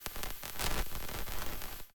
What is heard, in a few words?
Accent, Noise, Transmission, Soft, Whine, Crackle, Complex, Interference; Airborne Sound